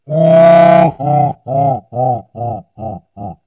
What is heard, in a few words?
EVIL AUGH